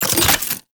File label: Alchemy Grenade Drop; Entrati